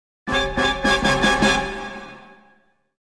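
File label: Sting Heavy; Family Guy Back to the Multiverse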